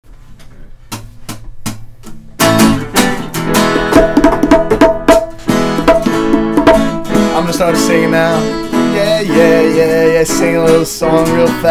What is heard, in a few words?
Pub Sound Check